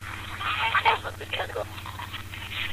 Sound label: Reversed